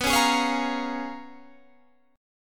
Db 13th B, guitar

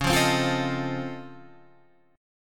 Db Augmented Major 9th, down, Guitar, Open G

